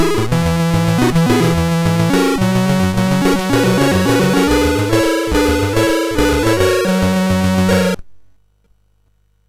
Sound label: sid MOS6581R3, GAMES; Continental Circus